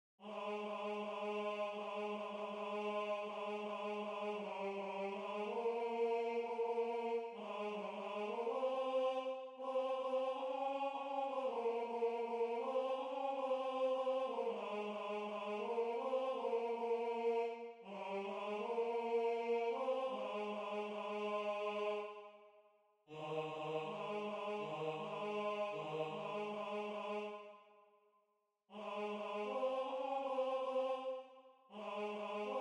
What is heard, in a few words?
Tenor